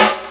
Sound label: SD HOU, Dance Mega Drums; Best Service